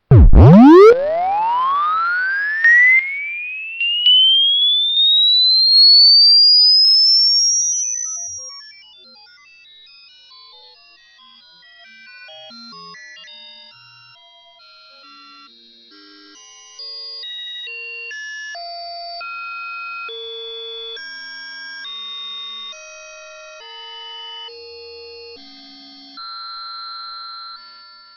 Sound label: ringtone